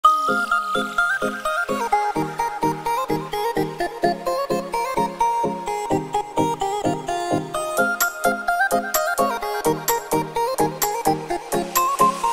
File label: romanian etno instrumental music